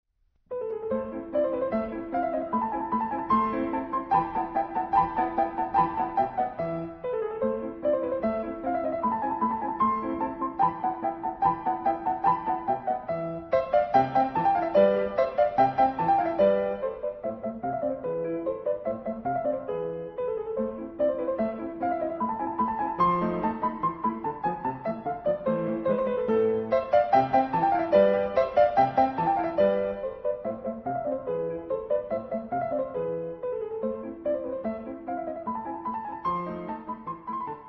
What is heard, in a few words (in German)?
Mozart, Türkischer Marsch